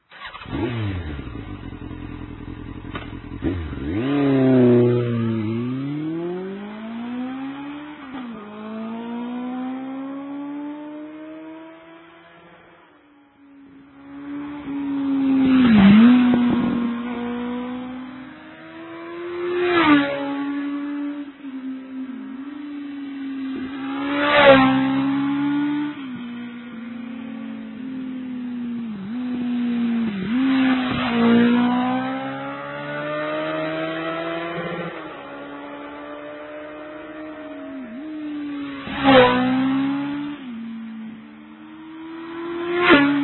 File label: yam 1000 r1 ext